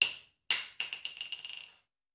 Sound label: Bullet Shell; Sound Ideas; Guns